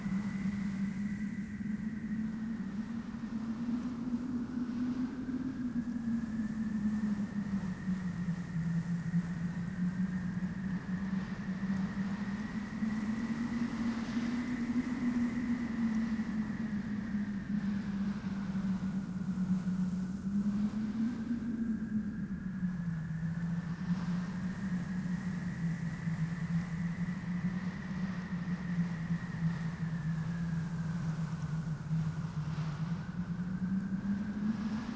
ambience arctic; Rust

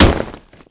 foley generic kick body

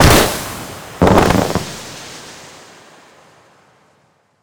Smoke Grenade; DCS

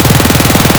glock18 indoor close loop; Escape from Tarkov

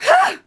Archer Attk Vox; Civ4 warlords

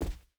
footstep boots cloth jump land; Rust

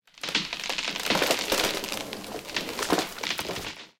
tree creak

